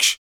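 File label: vox percussion, Vocal Planet Jazz FX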